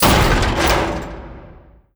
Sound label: entry swing, dog; npc; scripted